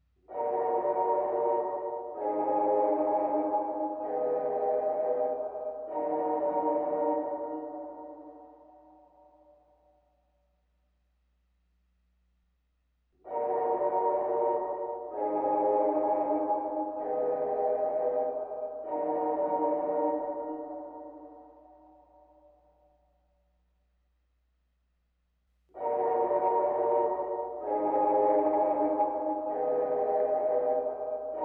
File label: Organ; Warhammer: End Times; Vermintide II; Bogenhafen